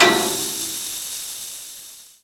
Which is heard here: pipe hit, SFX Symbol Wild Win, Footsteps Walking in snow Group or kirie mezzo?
pipe hit